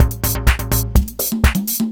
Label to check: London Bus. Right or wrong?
Wrong